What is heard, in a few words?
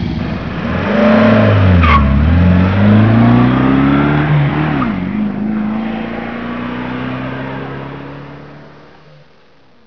car drive